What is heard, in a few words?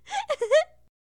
Kitchen Tickle